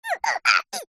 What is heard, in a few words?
cartoon character vocalisation high pitched fight grunt